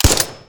pistol

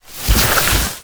plant strike

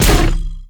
magic sword

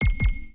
ui countdown

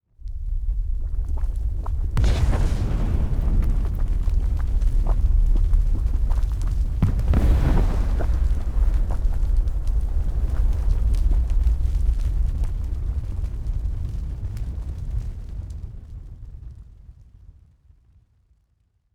magma, ambience

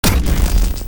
lightning chain hit

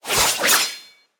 sword whoosh long

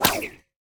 whip

